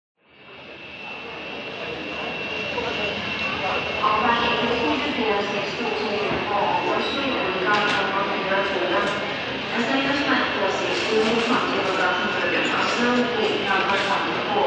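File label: sounds of airport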